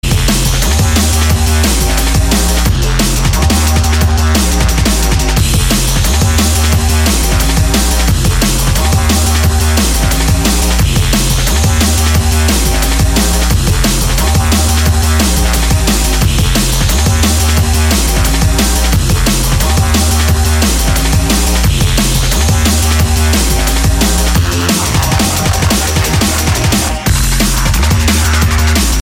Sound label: drum bass background music call tone ringtone